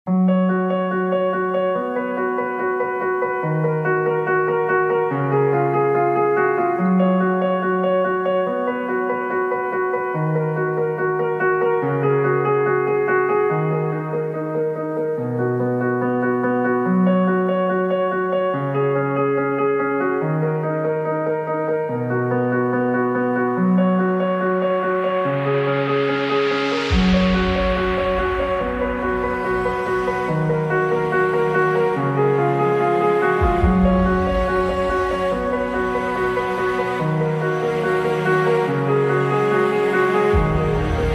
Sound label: saz